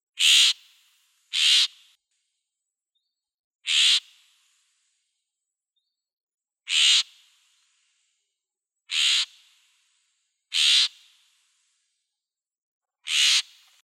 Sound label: Florida Scrub Jay, songs